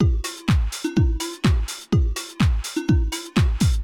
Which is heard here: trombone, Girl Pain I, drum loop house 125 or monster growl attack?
drum loop house 125